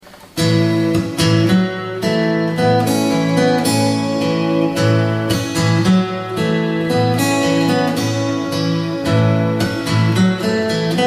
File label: fingerstyle, guitar